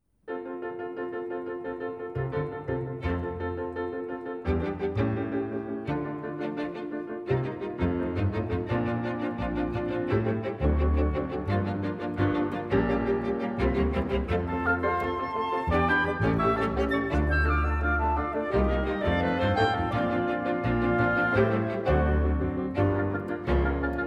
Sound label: instrumental